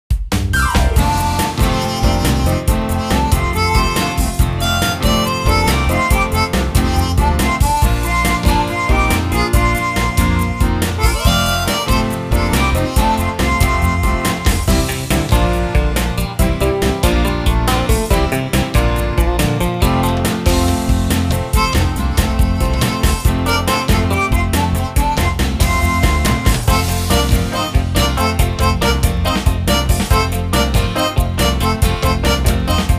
BGM STAGE; Pokemon Battle Trozei; Pokemon Link Battle; Nintendo 3DS

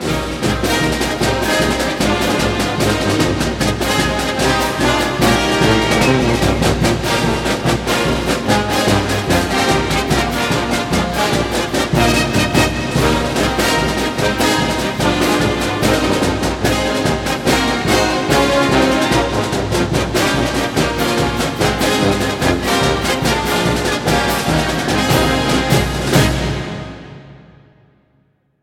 Broadcast Fight Song

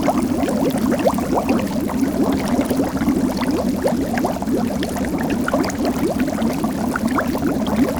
Magic Harvest; Levels Hub Swamp 03 Cursor Harvest

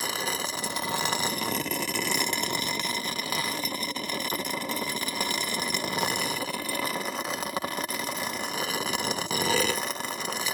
glass slide loop

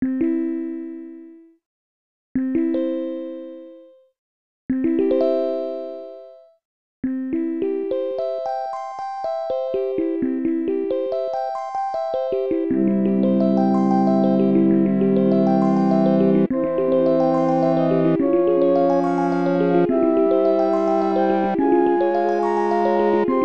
Harp; SD Gundam Gaiden Knight Gundam Monogatari Ooinaru Isan; SNES

